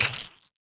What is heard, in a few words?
Splat